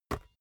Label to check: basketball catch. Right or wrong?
Right